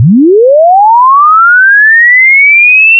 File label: sweep